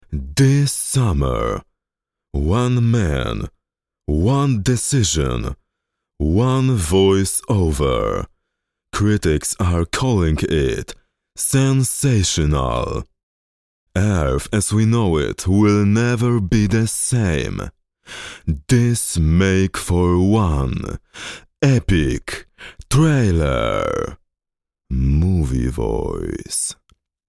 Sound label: trailer